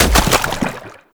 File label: Land Sludge, Footsteps; Warframe; Tenno